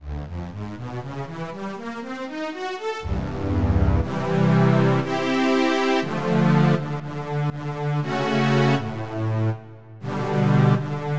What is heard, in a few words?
Strings; Onj's Soundfont